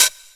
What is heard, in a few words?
Electro Closed Hats